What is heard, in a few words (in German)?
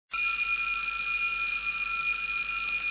jaeger 918, armbandwecker